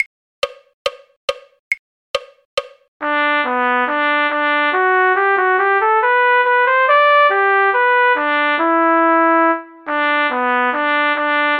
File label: trumpet; Soldier Soldier Won't You Marry Me; folk